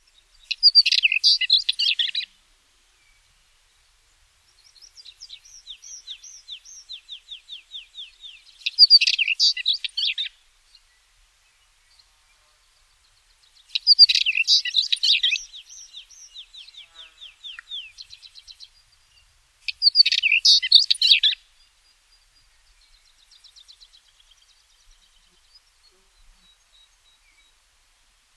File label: Northern Wheatear Oenanthe oenanthe